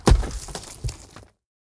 rock fall

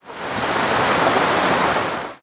ambient battle; World Of Warships

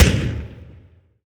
NR23 End; Weapons; DCS

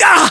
pain; english

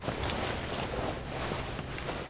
cpr medic loop; Call of Duty Modern Warfare

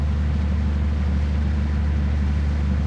Technic Engine; DCS

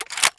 rsass bolt out; Escape from Tarkov

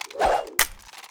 salvaged icepick holster; Rust

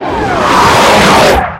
damage hits explosions, Warships

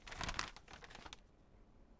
Paper; Civ4 warlords

